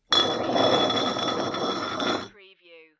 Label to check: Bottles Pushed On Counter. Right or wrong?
Right